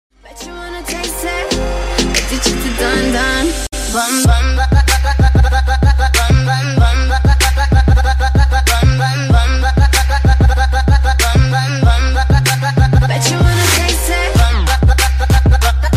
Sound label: Musical Trap Remix Ringtone